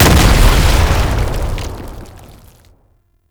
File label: tank infested swipe impact; Enemies; Abilities; Lasrian Tank; Warframe